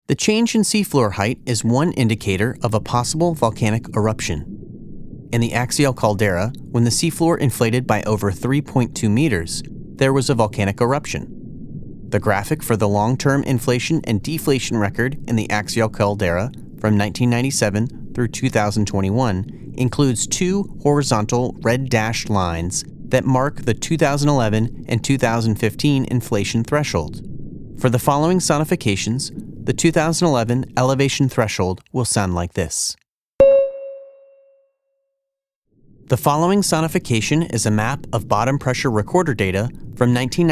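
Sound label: Axial LT Audio Display Track with Ocean Bed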